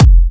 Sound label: Kicks; Vengeance Samples